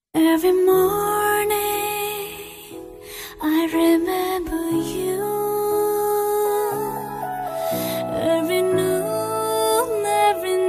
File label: songs for ringtone; mungaru male 2